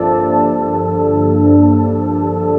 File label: FLUTE L; Sound Gallery - Stack Ware